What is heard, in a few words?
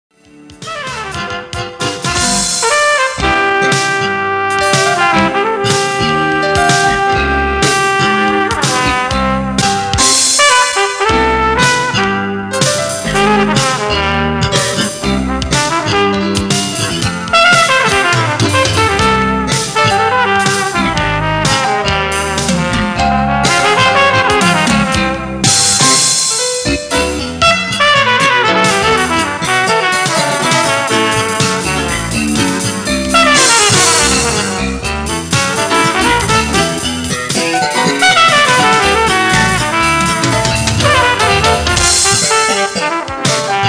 flugel